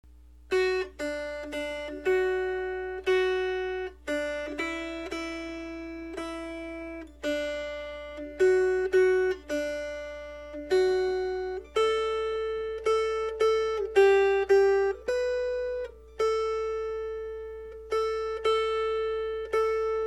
Melody; Moonshine

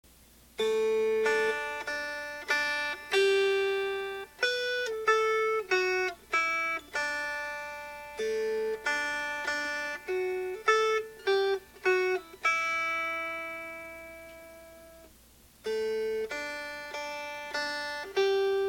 Melody

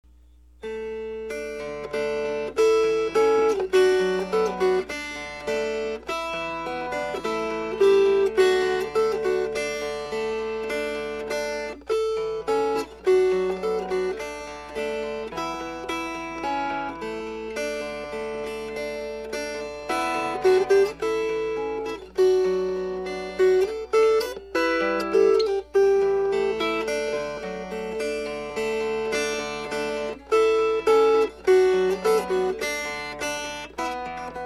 Fingerpicked

